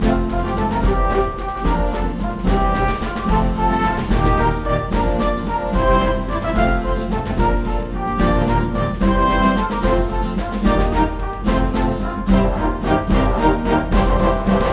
Duel Music Intro

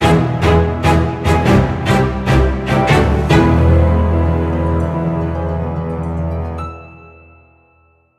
Victory Stinger; Phineas and Ferb: Across the 2nd Dimension; psp gamerip

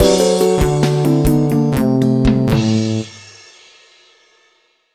Lose; mario vs. donkey kong minis march again; nds

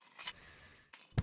Handling yoyo; talking tom cat 2 android ios online gamerip 2011

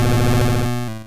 Self Destruct; Pokemon; Gen 1; attack moves; RBY

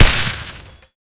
PKP; GOLD

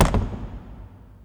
Door Wood Castle Shut; Lucius III

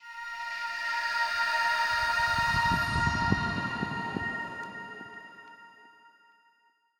Portal Exit; Proteus

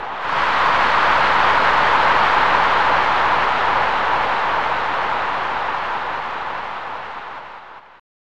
Wild Crowd

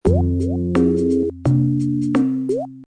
Loops Drip Drop; Scratch

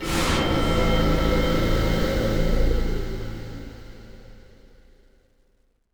Combo Sting

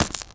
taser stun gun zap electricity; SFX; Environment; Guns Weapons; ProSoundCollection v1 3

